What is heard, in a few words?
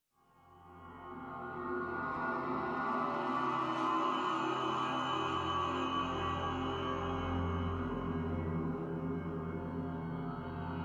Drones Only